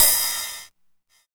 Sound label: Ride, Roland MV8800; DIRTY 9